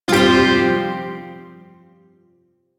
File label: Pent Min Chord F; Warframe; Duviri Shawzin